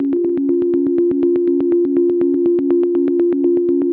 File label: 122 BPM Arps C maj A min Tonic F; arp essentials